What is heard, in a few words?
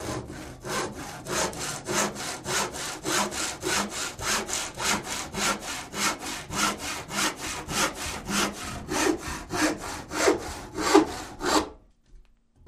Saw Hand Cutting Woo, saws